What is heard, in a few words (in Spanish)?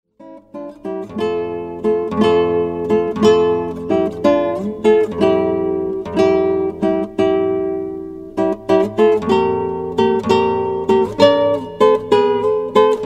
MARCHA FUNEBRE